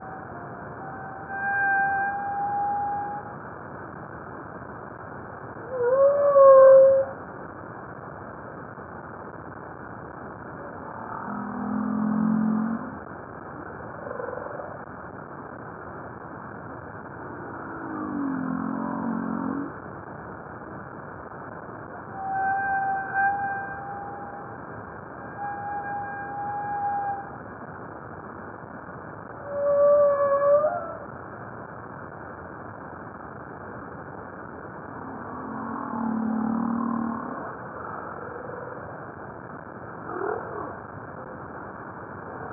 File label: humpback song